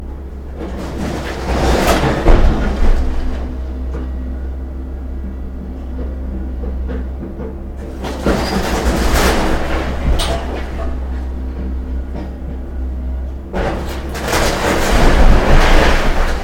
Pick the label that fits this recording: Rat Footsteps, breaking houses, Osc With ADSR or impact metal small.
breaking houses